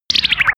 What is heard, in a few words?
bubble laser; krieg-waffen; soundpool